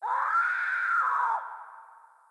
scream

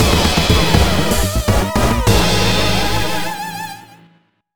Jingle MISSION FAILED, Nintendo DS; Chousoujuu Mecha MG

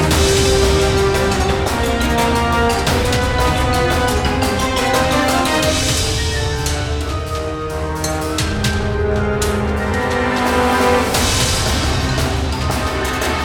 ghost closer Loop; bail or jail; obakeidoro; windows

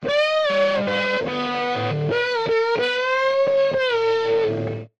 Riff; Aveyard Riff

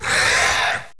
spawn runner

